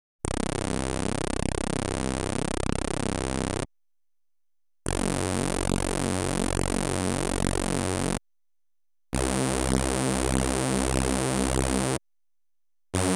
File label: dance & techno, lead; Inspiration Zone